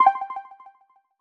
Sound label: Miss